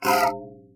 High Tech Ui Cancel

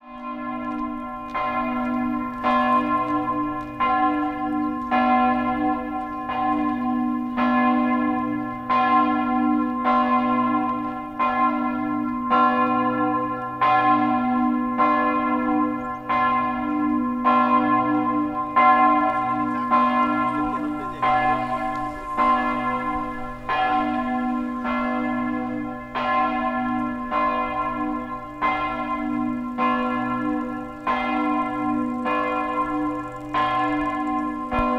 Church Bells Aquileia Italy; Zoom; 16bit

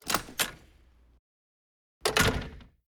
Door Metal Institutional Push Bar Open Close

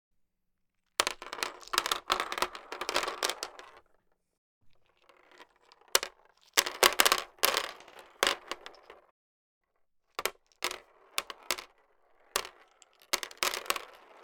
Dropping Chess Pieces On Chess Board

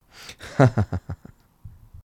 Male Chuckle Laugh Chortle

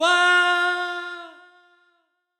Death falling

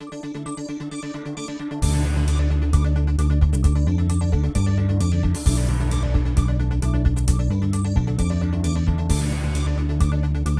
Countdown News Intro

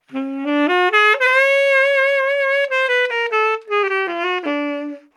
Tenorsax

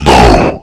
Monster no

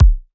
Analogish Kick

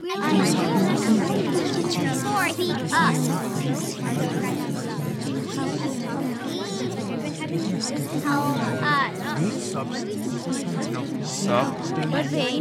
Crowd Murmuring

